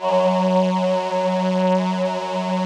VP-330, String Machines

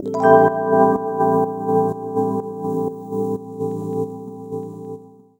Chords Tones; Equipped Music; Loungin House

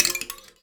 Finger Stash Hit, Prepared Kit; Battery 3 Sample Kits

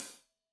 Hat Loose, Hi-Hat, Cymbals Stereo

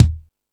Lotsa Kicks, Drum Machines E-MU Pro-Cussion

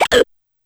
Hiccup

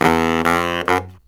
BARYT, Killer Brass Riffs; USB Soundscan vol.29 - Killer Brass Riffs; AKAI

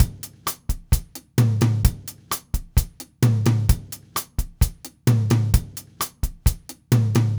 Cha Cha, Latin Percussion, Drumset; Sonic Foundry (Sony Creative Software); Joe Vitale Latin Percussion

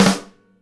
SNARE, Live Snares; E-MU Producer Series Vol. 8 – Platinum Phatt

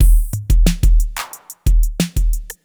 Smooth, R&B Drums

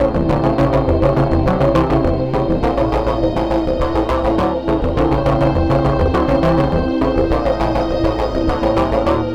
philter (mr scary); Sonic Foundry (Sony Creative Software); Ugly Remnants VOL-2

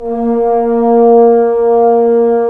BRS Tbns; Roland - LA Composer vol. 4

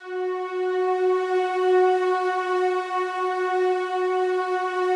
slow strings F#3, Yamaha VP-1; 90 s Sample CDs; Music Store

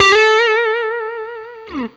BLU TRILL, Guitar & Bass; 90 s Sample CDs; Quantum Leap - Guitar & Bass

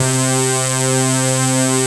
PRO 5 SYNS; Pro-Rec - Synthsonic

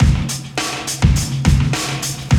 Loop, Roland; Sound & Vision - Gigapack I

